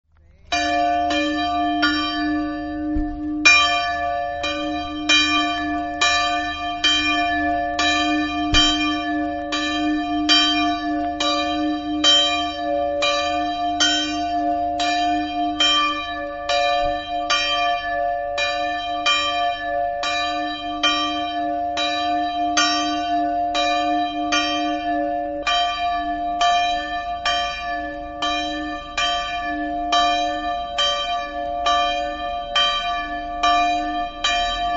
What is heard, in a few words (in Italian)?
campane